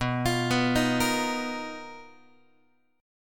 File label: B Major Sharp 11th, Guitar, 4A,4E,5A,5D,5G sharp,6C sharp; arpeggiated